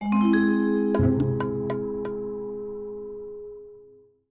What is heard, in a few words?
flourish; Return to Monkey Island; Meanwhile